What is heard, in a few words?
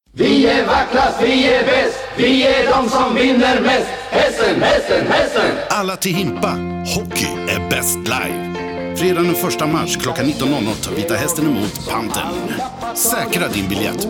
Jingle; Vita hästen; Pantern